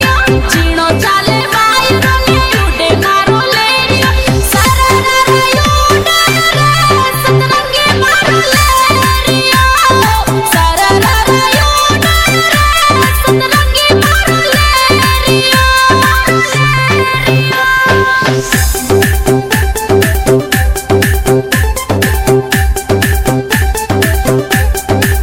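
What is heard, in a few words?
rajasthani dj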